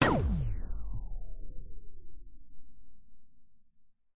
science fiction machine sudden switch off power down